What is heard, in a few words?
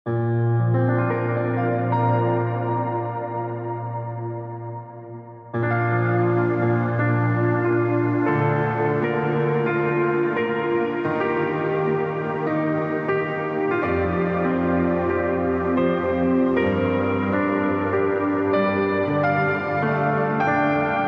Inspirig Piano